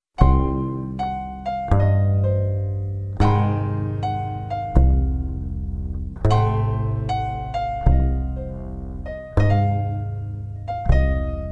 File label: jazz rainy night ringtone